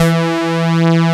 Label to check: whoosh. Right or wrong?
Wrong